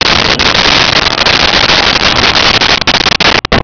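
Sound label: ambience crane winch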